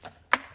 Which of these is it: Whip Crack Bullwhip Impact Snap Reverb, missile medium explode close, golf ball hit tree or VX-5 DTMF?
golf ball hit tree